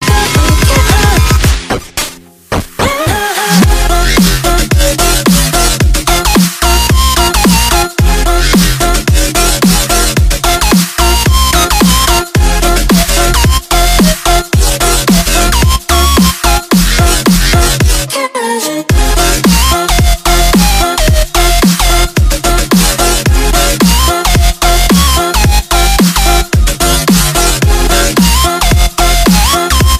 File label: Glitch hop ringtone